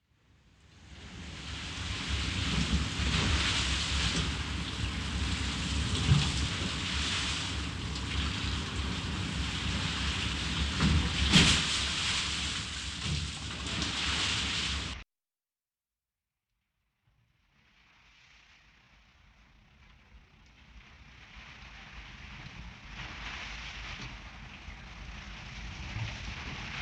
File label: ship rigging; Ambient and Specific Samples